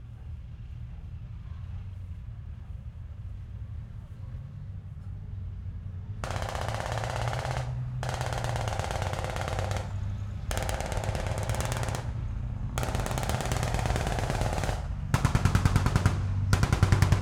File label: Tank Battle Machine Guns (7.62 & 50 cal), Walkie Talkie Chatter, XY Stereo; Double Trouble Audio; Tanks and Jet